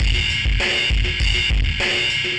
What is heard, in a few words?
ind dist ride